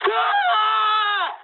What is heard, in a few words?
jp aircraft destroyed scream, speech jp